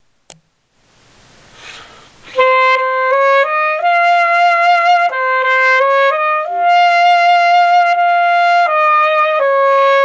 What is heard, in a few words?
Sax line